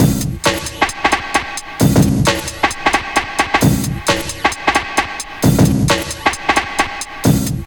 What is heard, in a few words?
DRUM, 132; Megabass Remix! 2